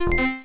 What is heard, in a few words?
error